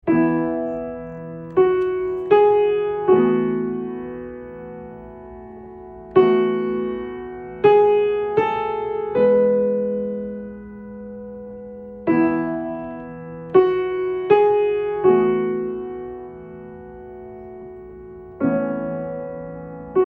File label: piano piano; Farou